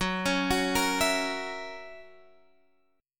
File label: Gb 7th Gb down, chord